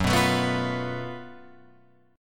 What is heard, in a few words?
Gb Minor Major 7th Double Flat 5th, Guitar, Open F, chord; strummed; 6,6,9,6,8,6; JGuitar